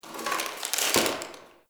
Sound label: Box Of Trash Rustle